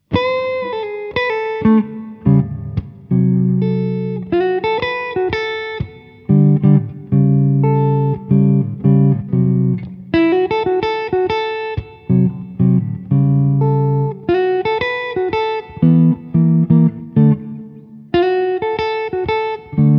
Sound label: guide tones blues